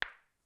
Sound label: hit; ArabDesert